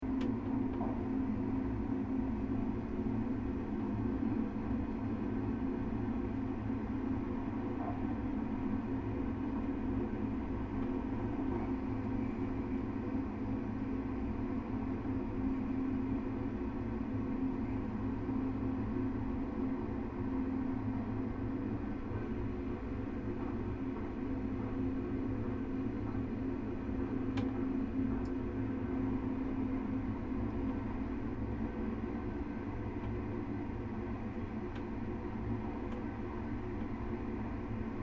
Eurostar High Speed Train Internal Carriage Between Security Door; Transport; Train Sound Effects